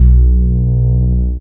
Power, baesse

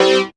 dance chord

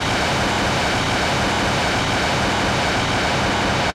Wave

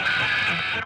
Tech Vocal

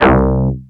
Dolby, baesse

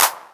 clap, percussion

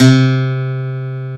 Acoustic Guitar

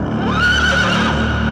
Synth Horse

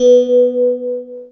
Bell Vox; instrumente

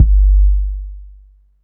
boom

